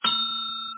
cooking Over; superChef

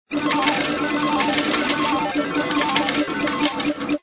slots rolling; wanNingTaiQiu

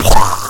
zombie hit; DoomsdayRescue

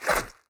Zombie Normal Attack; ZombieBattle; audio zombie

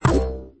punish, 台球; wanNingTaiQiu

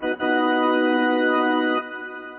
fail; superChef

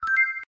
hint; wanNingTaiQiu

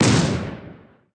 explo; ZombieBattle

